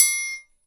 TRIANGLE; InVision Interactive; Percussion Slam; ZOMBIE

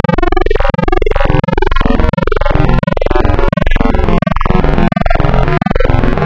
Spectral, wavetables; Architecture 32

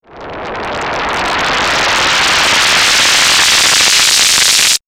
Take Off, sci fi synth fx; X-Static Goldmine

